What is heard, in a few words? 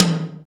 TOM TOM, Real